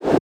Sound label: wind, fx; Pop; Drums; Slate; SD PASADENA Pop Sample Pack